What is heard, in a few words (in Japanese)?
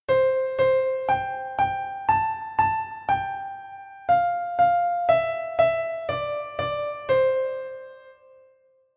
ハ長調, piano